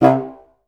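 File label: staccato C2, Orchestra; Best Service; Peter Siedlaczek's Advanced Orchestra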